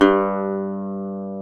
GTR Dobros National; Club-50 - Foundations Roland

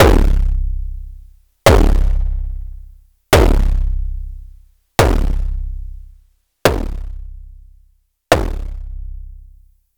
Snare Distorted Feedback

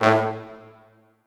Orchestral Brass, A1

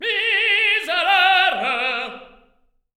TEN. TRAD; Spectrasonics; Symphony of Voices

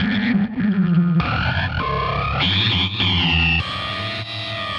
synth 100 impolite; Transmission-X

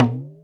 Talk Up, Alesis D4 drum machine

